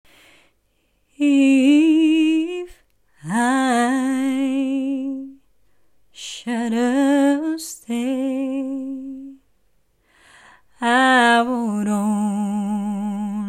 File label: Vibrato song